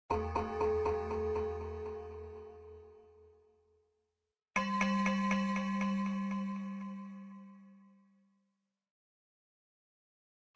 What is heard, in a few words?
Dungeoneering Floor Track